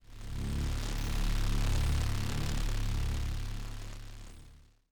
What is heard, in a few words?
Aggressive Car; Lucius III; pc linux